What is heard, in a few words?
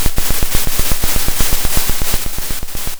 pop, Sound of a drum roll